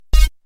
beep switch sound

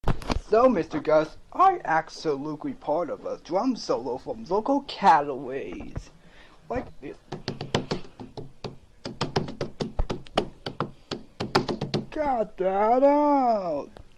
drum solo; pizza steve

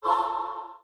hah

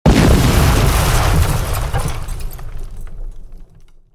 explosion bomba

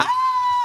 aaaahh

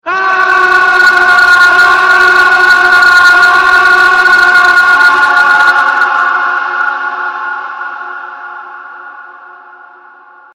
death yell

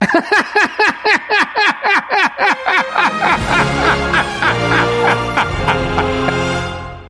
hahahahaha

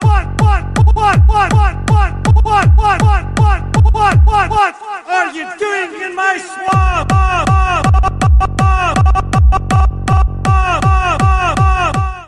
swamp remix

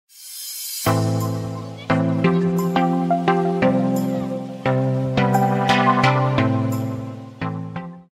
vlog intro music

